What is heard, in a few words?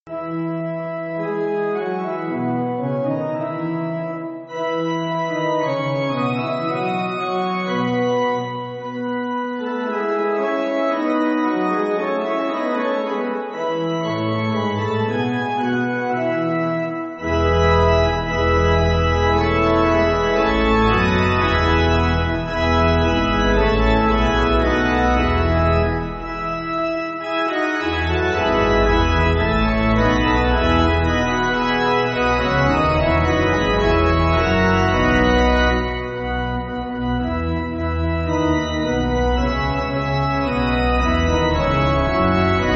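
Pipe Organ, Music Backing; Behold The Saviour; Camerons; 6.6.8.6; 5/E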